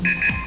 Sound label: buzzer